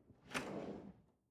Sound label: drawer large trash draw short